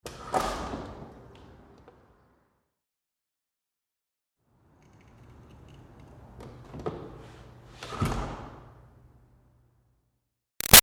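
Door Metal Commercial Push Interior Light Open-Close; Metal Doors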